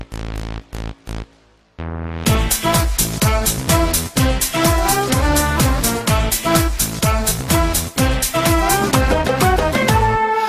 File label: armenian